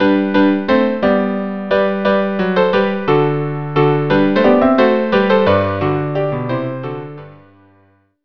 trio

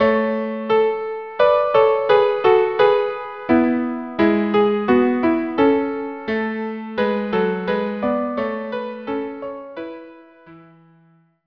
viola duo; la grace telemann

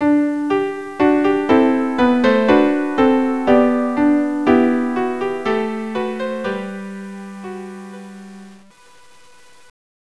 trio

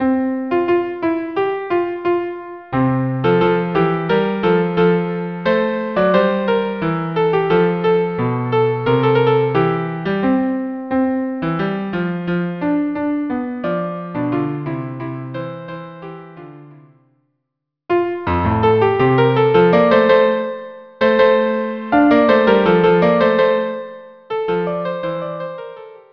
Duet viola and cello; CPE Bach

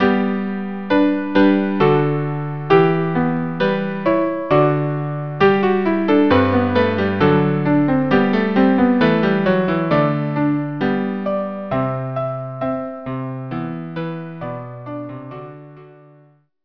trio; o mistress

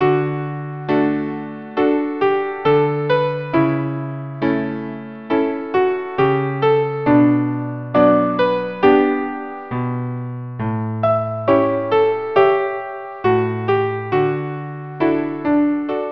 Arioso viola

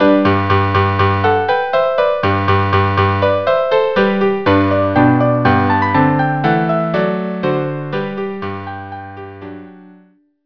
trio

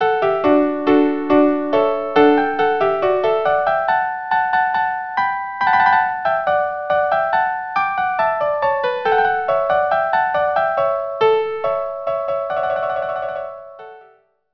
rondeau violin duo; mouret

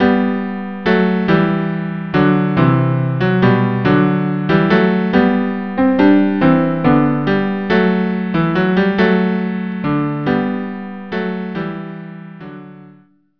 galliarde cello; frog

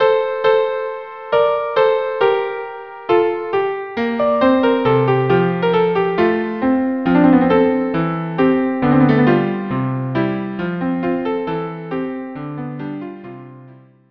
viola duo; cpe bach; andantino

